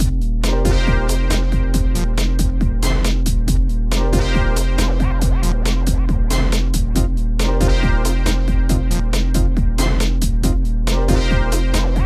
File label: Get Your Groove on, 138 Fmin, Hardcore Breakbeat; Big Fish Audio; Hardcore Breakbeat Action